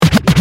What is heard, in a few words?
Scratch